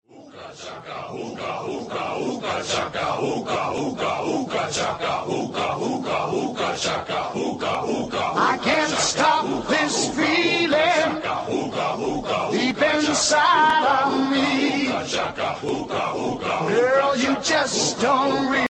rock ringtone; iphone se

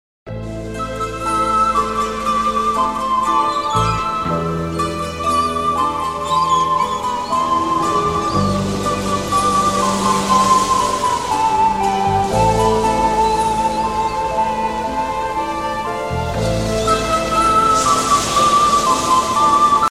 caravan waves

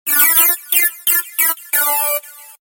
iphone 3g answer me ringtone

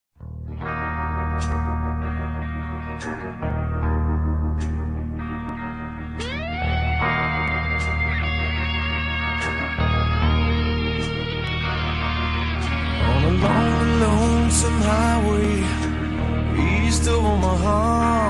iphone 3g s rock ringtone

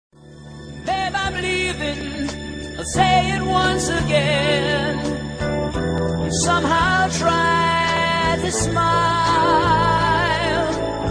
rock ringtone; iphone 3g s

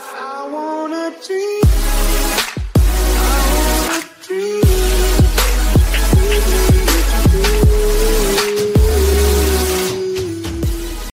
dream ringtone; i phone se

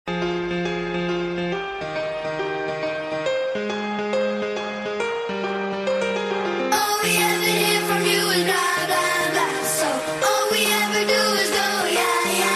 piano; iphone 6s plus

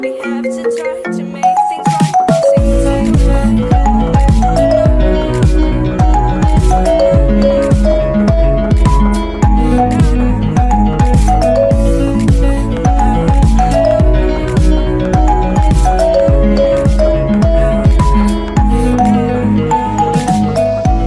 iphone xr ringtone

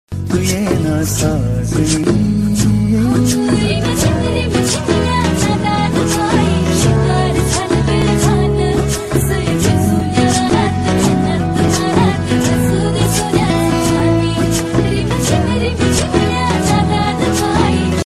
marathi song ringtone

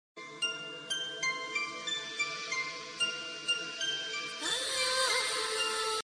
huawei flute music ringtone

